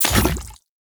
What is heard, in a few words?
Crafting Equip; Deimos